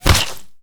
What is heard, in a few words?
bullet impact body flesh